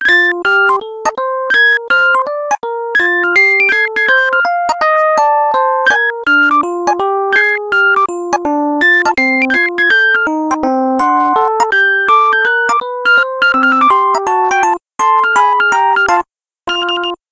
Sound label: jazzy, ringtones; LG G2x P999DW